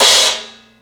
Super Crash Choke One-Shot, cymbal; KJ Sawka; Drum n Bass & Beyond; Drum Tool Box

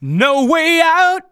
vocals sung F#; Techno Trance Essentials

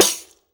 TAMBOURI.HIT; Best Service; World Colours

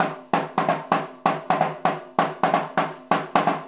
Tambourine

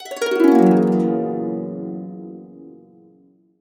Harps; USB Soundscan vol.62; AKAI

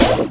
Flam Snare; Zero-G; Soundclash

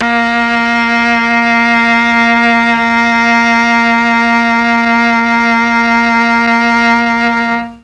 A#3 Mellotron cello; Mike Pinder - Mellotron Archive CD

